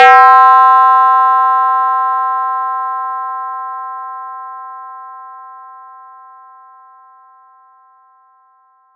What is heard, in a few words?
FX RINGR; ModelCycles